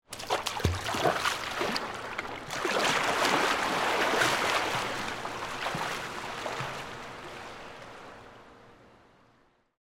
Pool Indoor Enter, Water, Splash, Enter, Stairs, Swim, Away, Indoor, Pool; Pools; BlastWave